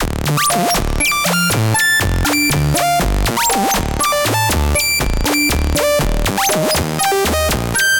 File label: Sqeak Full, Synth; Ableton; Factory Packs; The Forge by Hecq